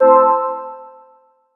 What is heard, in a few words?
matrix sad